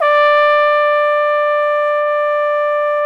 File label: Solo T.Bone, Solo Bone; Roland L-CD702; Solo Bone 1